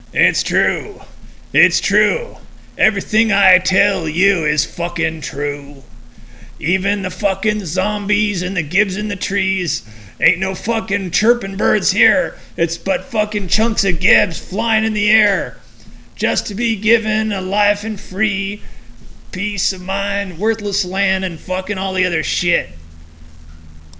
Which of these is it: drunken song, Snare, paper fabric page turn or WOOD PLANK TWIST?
drunken song